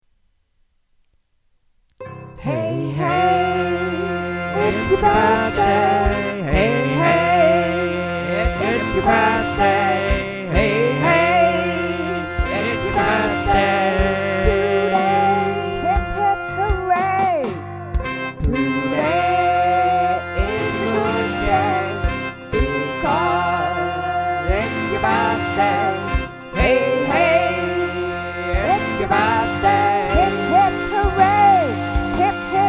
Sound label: Birthday music; khareji